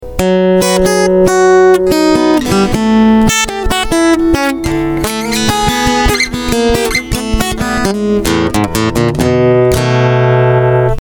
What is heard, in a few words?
lick